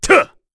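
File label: Vox Attack, Korean; Bernheim; kingsraid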